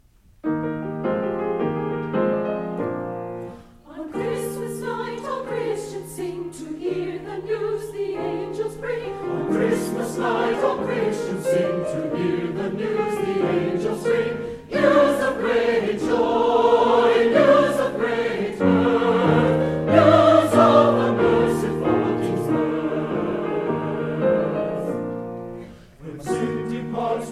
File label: Singers; Christmas; On Christmas Night